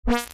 Broken Synth; SFX

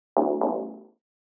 Boink, Melodic; SFX

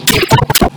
Audio Corruption, Electronic

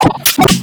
Audio Corruption, Electronic

